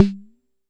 Jomox MBrane, drum machines; Jomox MBrane 11

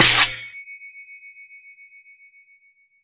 Processed Ethn Perc, ETHNIC and WORLD PERCUSSION

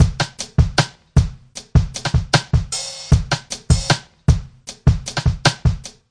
154 rimstick rain stagger, DRUM LOOPS and BREAKS

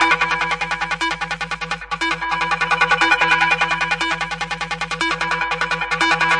150 machinegun synth, synth and electronic loops

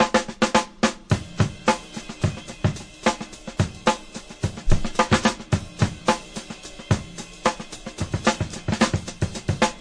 110 bpm, DRUM LOOPS and BREAKS

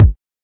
kik

